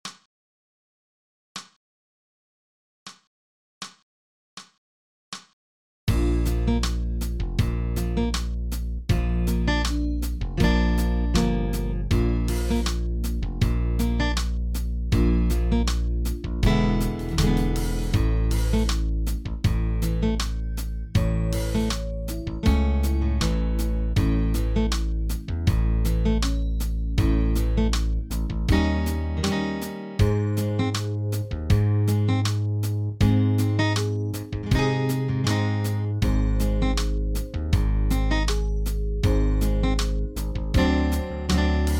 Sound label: Additive Slurs